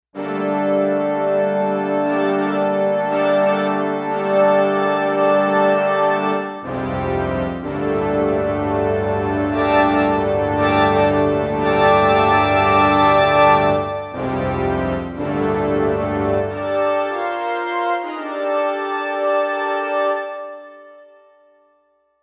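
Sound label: Brass SYNPHONIC, synth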